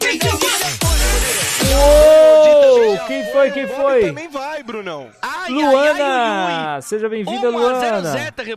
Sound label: uoooh